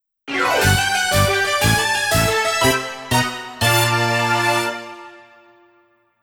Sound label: ジングル NEWS; EDF5; Earth Defense Force